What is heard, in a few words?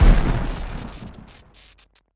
Boss Big Burst; Avie Boss